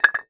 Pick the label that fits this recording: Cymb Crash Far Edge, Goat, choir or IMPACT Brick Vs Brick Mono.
IMPACT Brick Vs Brick Mono